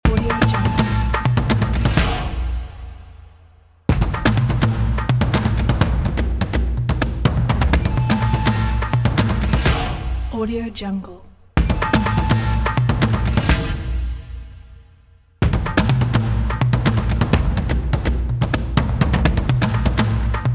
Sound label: primal drum logo